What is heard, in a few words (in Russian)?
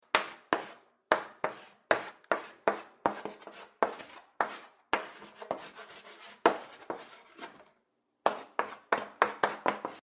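pishut melom po doske, writing with chalk on a board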